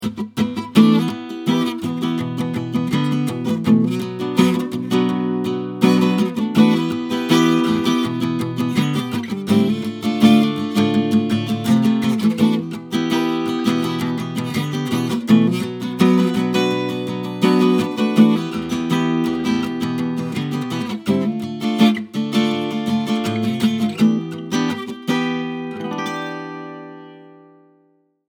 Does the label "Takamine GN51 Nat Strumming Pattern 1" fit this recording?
Yes